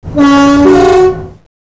klaxon, Train; OpenBVE; bigaré

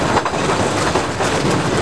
Run, Train; OpenBVE

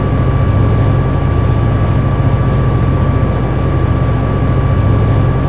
Motor, Train; P42DC x2 11 Car Superliner Long Distance

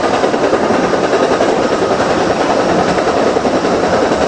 run, train; OpenBVE

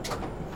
tbc click, Train; OpenBVE

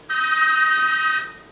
Klaxon, Train; OpenBVE

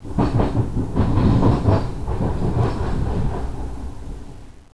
point, Train cl314; OpenBVE

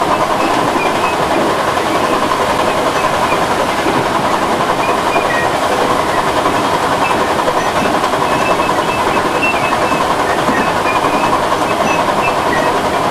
Run, Train; OpenBVE; Cl313 Silverlink

